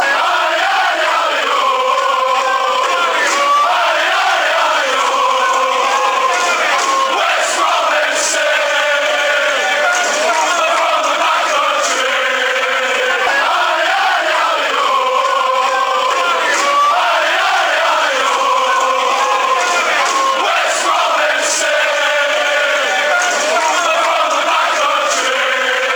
allez allez allez ohh, fan chants

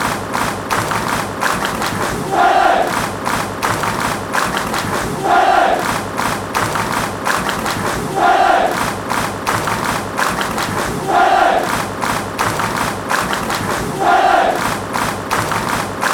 city claps, football chants; coventry city

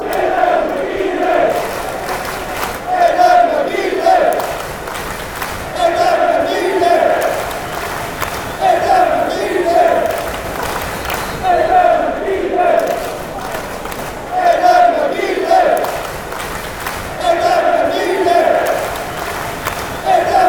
clap; aiden mcgeady

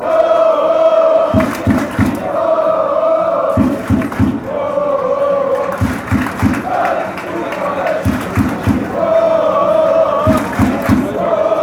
woah woah ale, fan chants; fc thun

